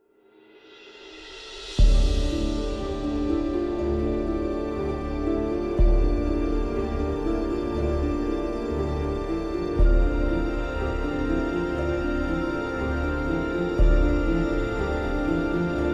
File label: (No Choir), Orchestra